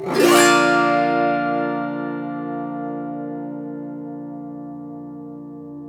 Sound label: AUTO HARP